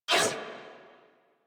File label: Pain, Female; en; Warframe; New War; Narmer Grineer